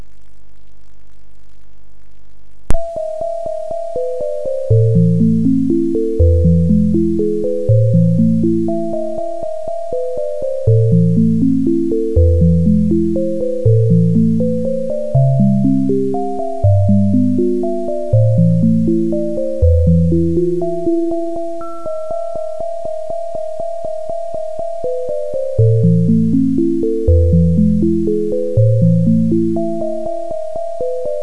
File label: musicbox